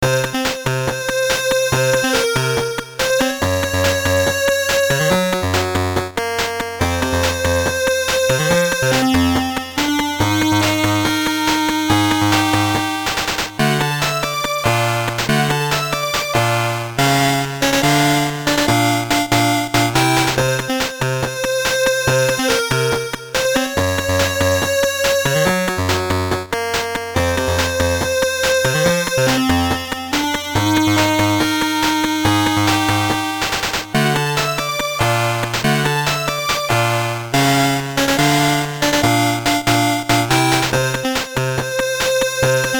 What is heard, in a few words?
BGM, WonderSwan Color; SD Gundam G Generation Monoeye Gundams